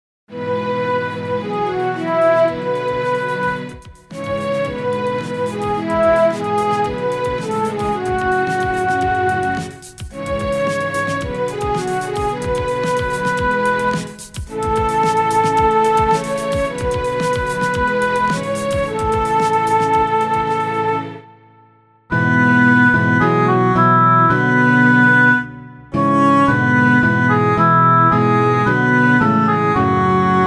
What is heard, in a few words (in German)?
keyb; jahreslosungen; losung 2015